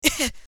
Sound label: Pain; Assemblee SFX